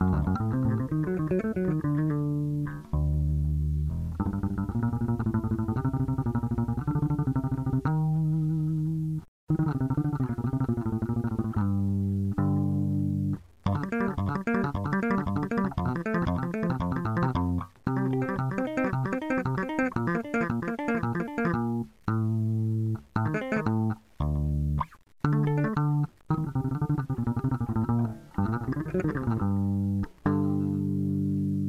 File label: Classic bass